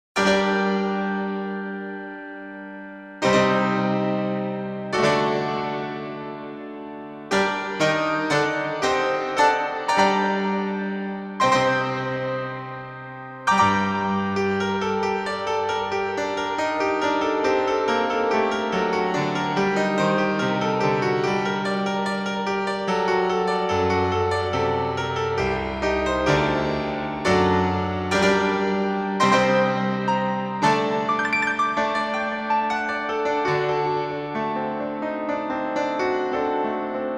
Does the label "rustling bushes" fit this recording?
No